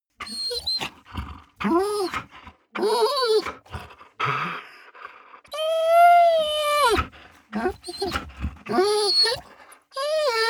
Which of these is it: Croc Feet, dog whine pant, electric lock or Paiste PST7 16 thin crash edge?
dog whine pant